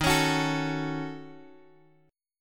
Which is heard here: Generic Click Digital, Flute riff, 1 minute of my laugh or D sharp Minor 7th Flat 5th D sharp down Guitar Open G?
D sharp Minor 7th Flat 5th D sharp down Guitar Open G